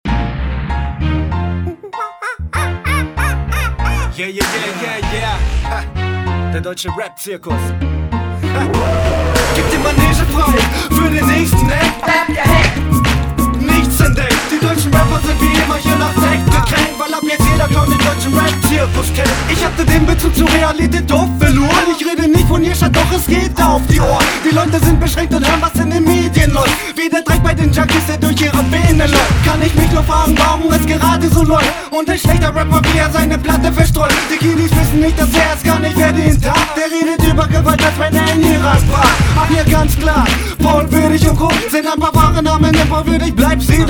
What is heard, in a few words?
RAP; ZIRKUS